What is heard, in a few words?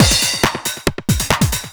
percussion loops 138; PS-45